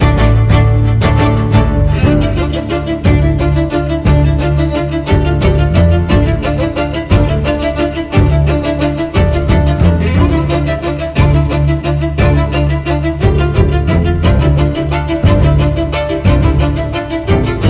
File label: BGM battle